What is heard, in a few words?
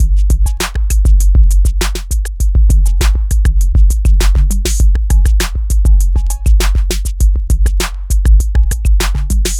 mo cowbell, Drum loops, Electro